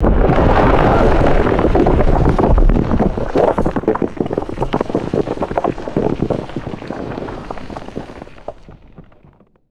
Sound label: large heavy rockslide tumbling and settling